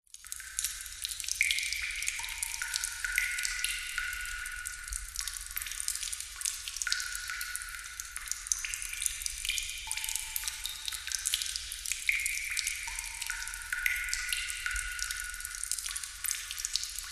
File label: nature water drips large splatter reverberant cave or abandoned warehouse